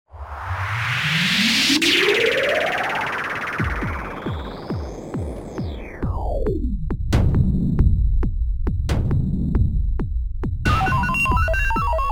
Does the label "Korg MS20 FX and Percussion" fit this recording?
Yes